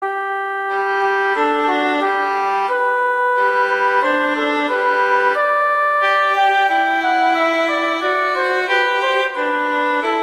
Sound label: Duet; Mozart; Duets